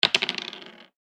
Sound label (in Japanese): モノラル 大きい ダブル ダイス